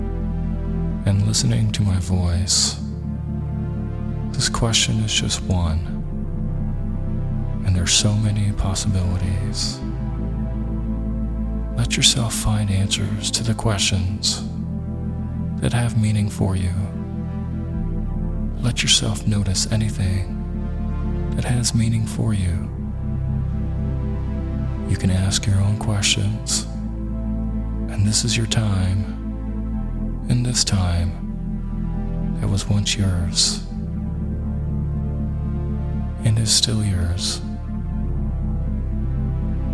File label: With Isochronic Tones